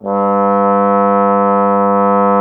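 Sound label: brass bass trombone G; Miroslav Vitous; Solo Instruments VOL-1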